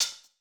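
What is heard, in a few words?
open, hihat; Pop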